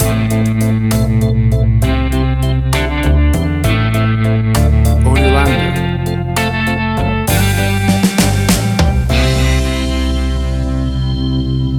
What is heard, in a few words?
50s School Prom Slowdance